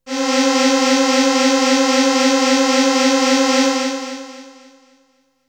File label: Melody oneshot; ZERO G - Datafile DISC 2